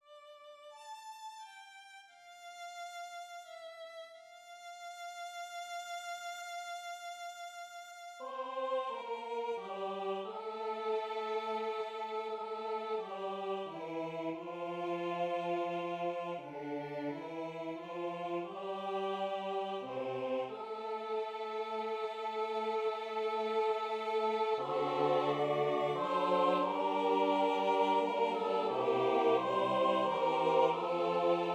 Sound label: choir; pray; 1부 기도송